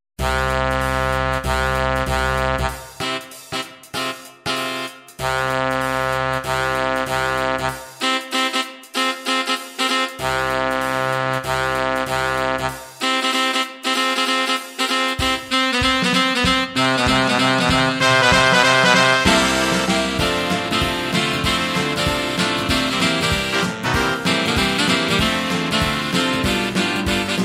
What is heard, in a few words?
Nabucco Swing